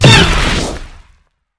wall jump; wall jump trick

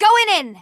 ping gank; female; female billy vo rework tifa ff7 remake

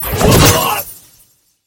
pillow cast; familiar

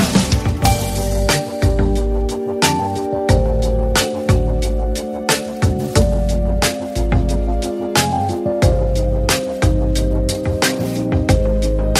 music hideout play base lp 155bpm; Risk of Rain music pack; in-game music

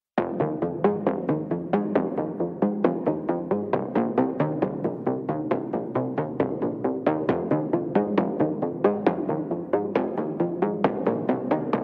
curio music

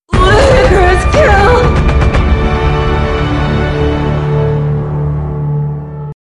music stinger; sexy killstreak announcer; UT2K4

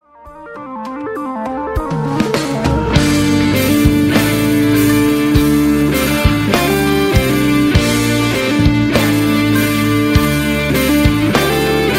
music brawl round start 95bpm; Risk of Rain music pack; in-game music

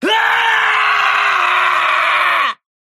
mirage desperation power, screams bloody murder; mirage screams bloody murder when he is low health

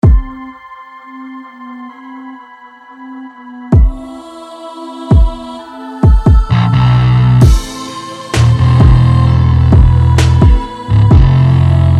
music match intro 160bpm

